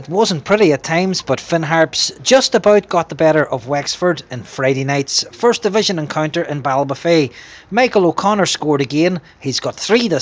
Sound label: HARPS; Finn Harps took their second win in three First Division games with a 1-0 victory over Wex